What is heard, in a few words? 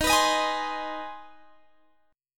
C Minor Major 7th Sharp 5th D sharp